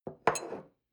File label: coffee cup place